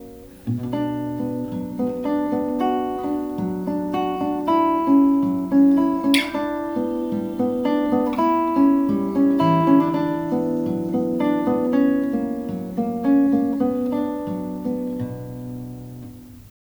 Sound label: corner of room